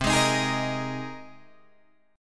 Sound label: C Minor 7th Sharp 5th C Guitar Drop D